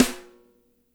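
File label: piccolo snare; Spectrasonics; Burning Grooves CD2; DRY KIT 4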